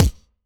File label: DnB Kick; drumnbass 170bpm; Drum Kits; musicradar; Drum Hits B